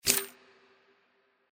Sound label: wild Symbol spin; Champions of Valhalla